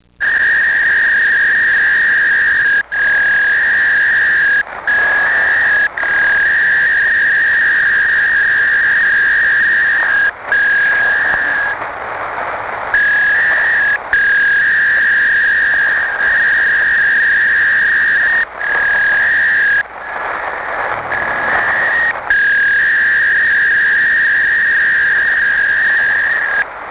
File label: packet300